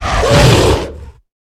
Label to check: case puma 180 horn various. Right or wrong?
Wrong